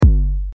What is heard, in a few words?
Kick Master